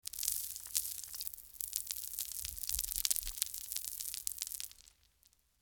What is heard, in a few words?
Debris Fall